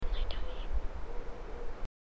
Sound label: Bigfoot 4 Whoops; Knoll